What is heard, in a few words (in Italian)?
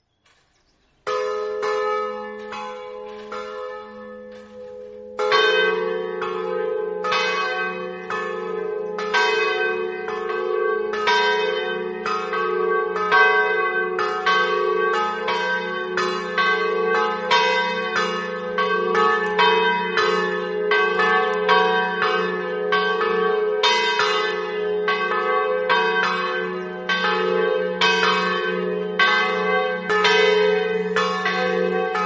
martignacco, campane